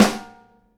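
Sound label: snare bottom DAMP, Live Snares; E-MU Producer Series Vol. 8 – Platinum Phatt (CD 1)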